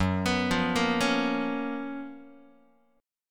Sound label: F sharp Major 11th, down, Guitar; x,2,2,3,2,0; 4A,4E,5A,5D,5Gsharp,6Csharp; arpeggiated